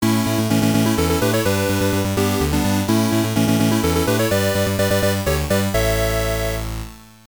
Start BGM; Star Pulse; x68000

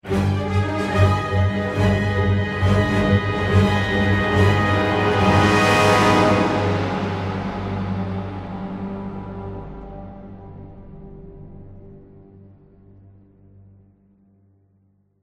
Game Stinger Victory; Wolfenstein 2009

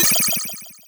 Credit SFX

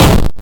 gunshot

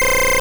Scary Face

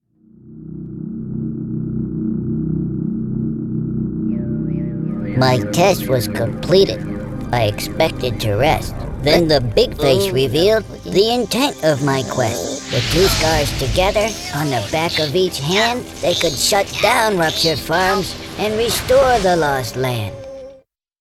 trials completed; Oddworld Abe's Oddyssee

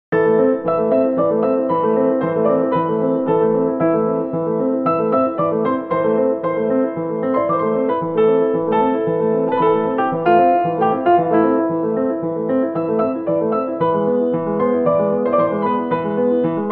Bayou Piano; MySims Agents

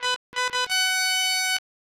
Horn; Ultima Runes of Virtue II; SNES

